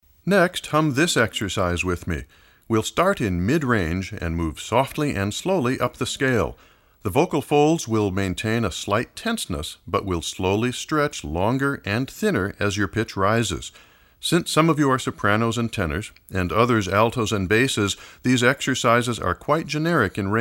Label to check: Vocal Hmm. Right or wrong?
Right